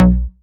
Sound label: Synth Cuts; Vengeance Samples; VES1 Instruments